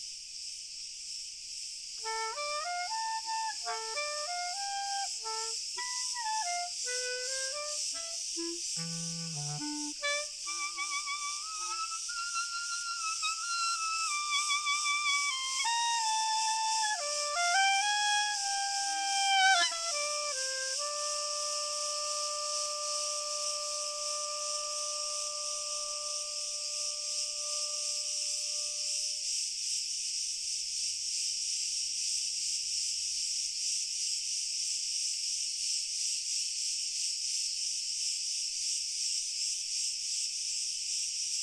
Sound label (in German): Stand Schoen Spiel Grillen Chor Fern